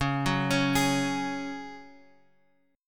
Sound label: C sharp Suspended 4th C sharp; C#sus4 Chord {X 4 4 X 2 4} arpeggiated